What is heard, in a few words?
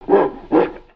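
Dragon Whimper; LU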